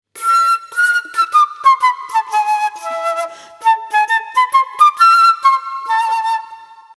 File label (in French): impro flute traversiere; pour les petits; loto sonore